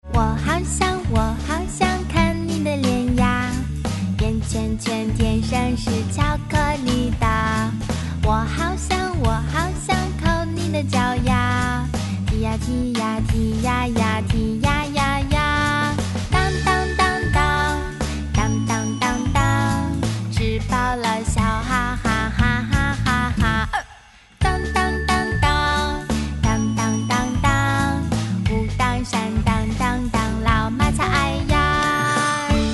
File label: ringtone